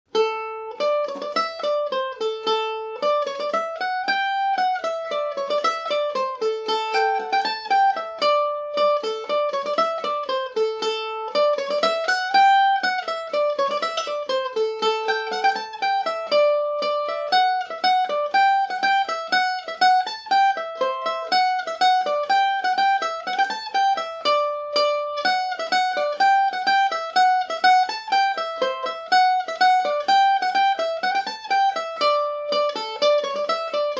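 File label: D major, irish mandolin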